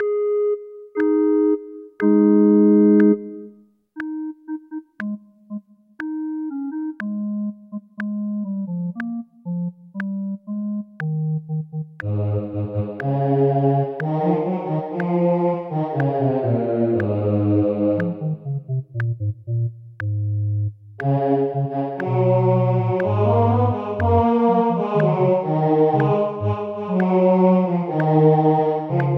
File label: O clap your hands; Gibbons